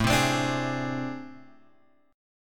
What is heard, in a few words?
A Minor Major 11th; {5 3 X 5 3 4} strummed